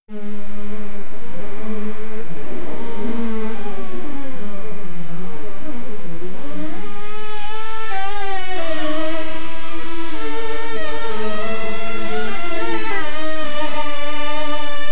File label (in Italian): spazzolino elettrico, brushing